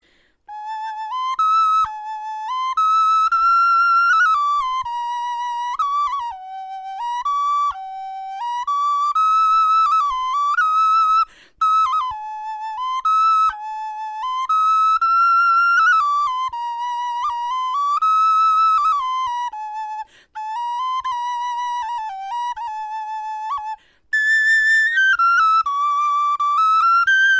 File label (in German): melodie, knochenfloeten